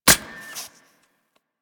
Shotgun Fire Empty; Weapons; Warframe; Tenno; Alchemist

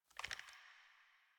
Pistol Reload Rotate; Weapons; DE; Warframe; Tenno; Lasrian

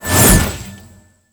Sword Attack Whoosh; Weapons; Melee; Warframe; Orokin Weave Sword; Weave Boss

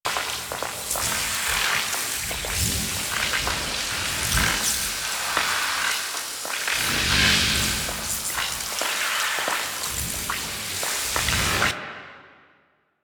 Void Gas Loop; Weapons; Warframe; Duviri; Incarnon Adapters

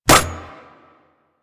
Rolling Drone Landing; Warframe; Grineer

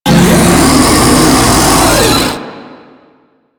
Taunt Scream; Warframe; Proto Infested Ancient; Abilities

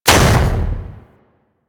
Eruption Cannon Slam; Warframe; Sargas Ruk

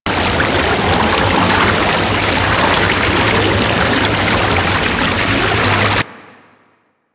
Water Fall Loop A; Ambience; Interior; Warframe; Orokin

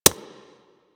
Infested Minion Step Default; Warframe

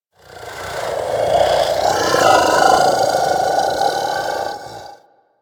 Vocal Snore; Warframe; Hunting Critters; Orokin Kubrow

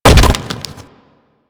Bolt Impact Torso; Warframe; Weapons; Cross Bolt

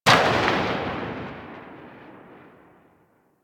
SMG Tail Ext; Weapons; Warframe; Tenno; Sundial

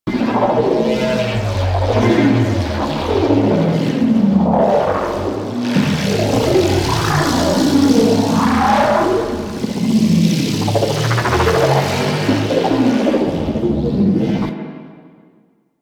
Portal Loop; Condrix; Warframe; Squad Link; Sentient

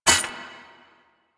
Scrap Spawn; Warframe; Missions; Zariman; Zariman Assassinate

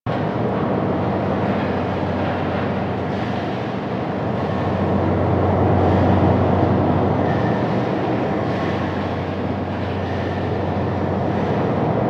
Asteroid Interior Amb B; Warframe; Ambience; Grineer Asteroid

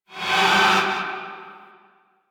Incarnon Void Charge; Weapons; Warframe; Duviri; Incarnon Adapters

